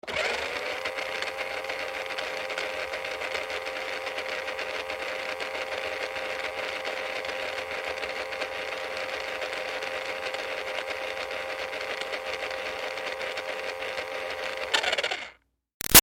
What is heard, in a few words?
Toy Bounce Back Racer CU Run Long, toy car